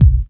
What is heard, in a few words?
BD, Dance Mega Drums, BD HOU; Best Service